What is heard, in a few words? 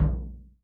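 bass drum SFT, 50S JAZZ; Northstar - New Gold VOL-1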